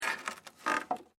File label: WOOD PLANK MOVEMENT; Material components for constructing more complex sounds; Materials